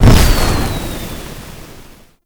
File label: Flamethrower Fire; Lunar NY Ignis Skin; Weapons